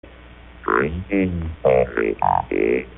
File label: Greetings Everybody Low, voder